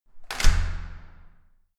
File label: entrance door close hard